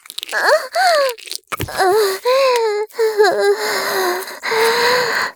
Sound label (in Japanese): 絶頂, ASMR; 騎乗位